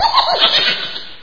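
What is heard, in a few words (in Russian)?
хихиканье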